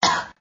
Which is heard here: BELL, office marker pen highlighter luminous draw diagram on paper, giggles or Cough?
Cough